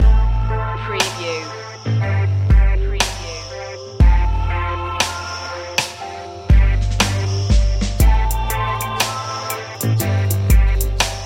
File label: dark fashion